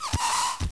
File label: Bandicoot Walk